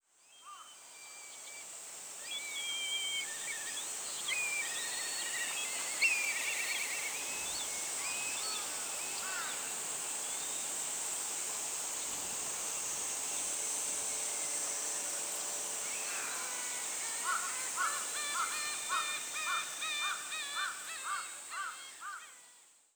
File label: bird